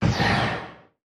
distance laser turrent fire; weapons; Stellaris

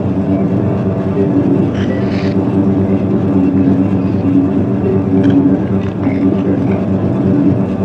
defense station idle hum; ambient; Stellaris

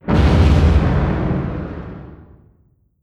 distance xl arc emitter fire; weapons; Stellaris

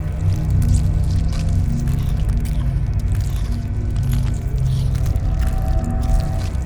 kaiju egg destroyed loop; biogenesis

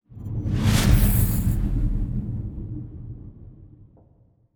cruiser move; aquatics

